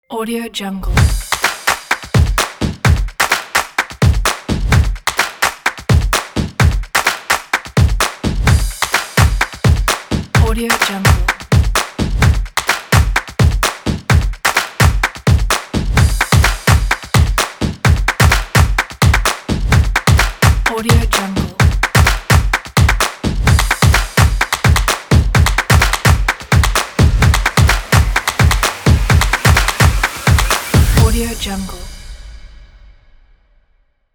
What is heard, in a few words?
the percussion